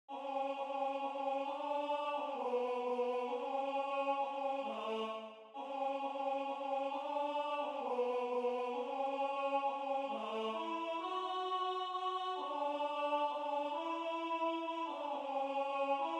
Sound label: Alto